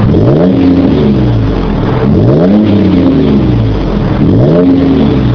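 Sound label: toyota fj cruiser 4.0l single; 2007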